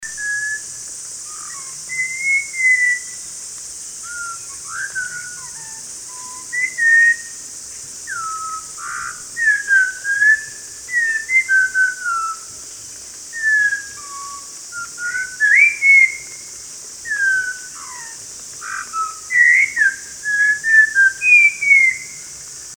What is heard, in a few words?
Malabar Whistling Thrush